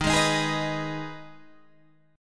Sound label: Eb Suspended 4th Sharp 5th, Guitar Standard; Ebsus4#5 strummed; 11,11,13,13,12,11; JGuitar